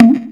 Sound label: mid tom drum sound a sharp key